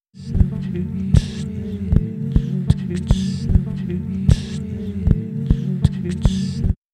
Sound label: loop; puredata; ALFALOOP